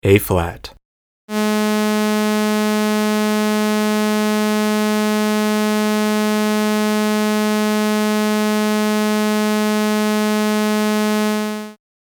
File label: trombone; jargon tunings; wind